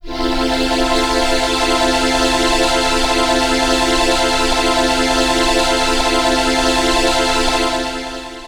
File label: E, Pads; Zero-G; Total Drum Bass